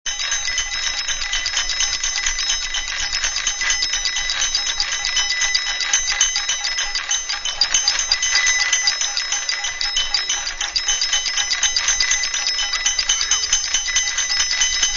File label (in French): sonnettes